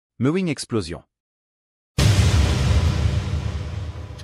boom; mewing

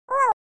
wow cat

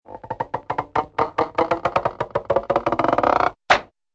long stretchy creak and pop

